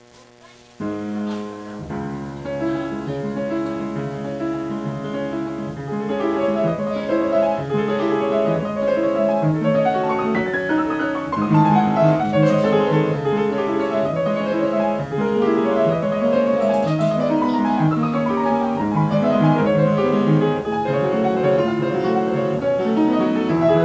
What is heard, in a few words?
fantasie, piano